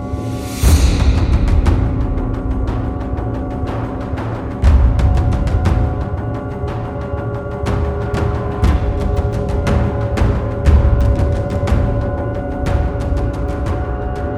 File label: School Ambient